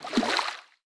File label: Floor Sink; boomerang fu